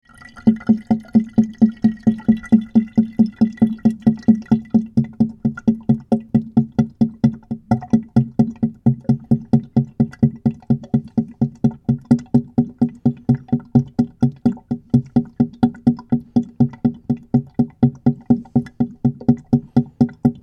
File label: Airlock In Sink Waste Pipe, Airlock in sink waste trap - Drip dripping sounds; Miscellaneous Household; Tom Hutchings